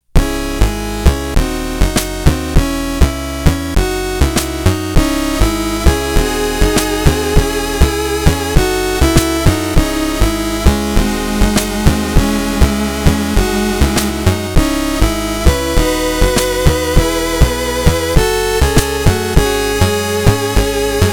Chiptune version; The Dark Spire; Training Ground

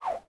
Fight Move; mighty party 2017 gamerip pc ios android

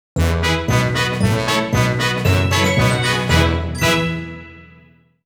Narrow Defeat Jingle; tokimeki memorial 4

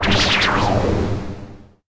Smoke dead large; The Denpa Men; Android

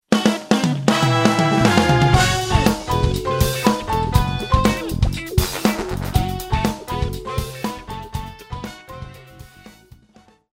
Combat Bgm Intro; MicroVolts Recharged

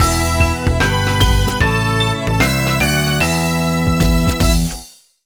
Pirate Coin Obtained; Watersong

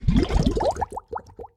Submerged

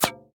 Item Ready; Kiosk

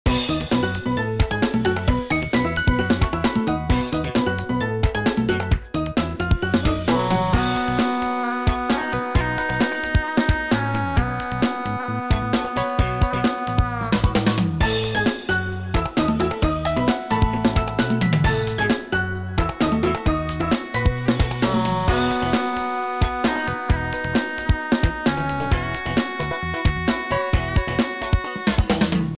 Music Bg Music Scotland

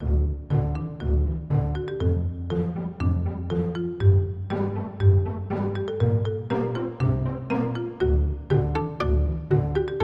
Game Music; Cut the Rope Arcade

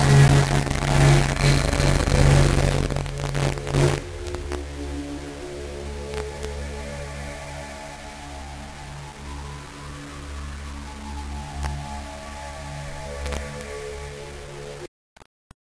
static

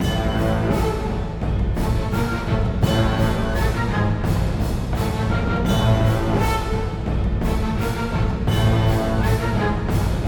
Music Combat Boss Power Phase

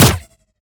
Sciweap Shot Small Gun Bolt Colt

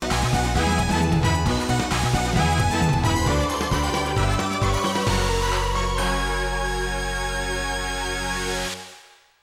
achievement; The Conveni 4; PS2

